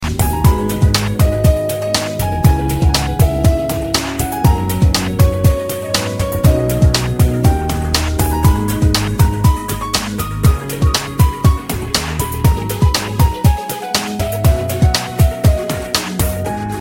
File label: Beautiful BGM Ringtone